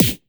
Snare Dnb; Unfa

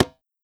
Perc Tabla Te

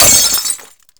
Smashing Glass; Pyrex

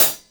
Hi Hat Sabian Closed; The Black Pearl V1

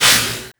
Sound FX Scifi Drum Shake; Audiopapkin

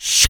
Shaker Shaker Rev; Sambone Ham

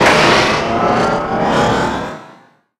Scifi Drum Crash; Audiopapkin

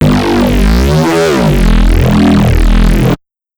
Bass Growl

